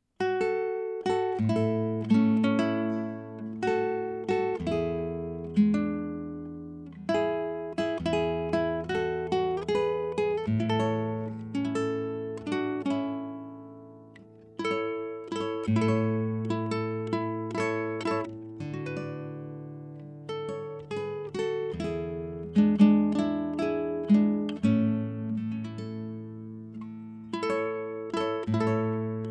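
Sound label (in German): Konzertgitarre Easypick EP 1 Alpenländisch ohne EQ Stereo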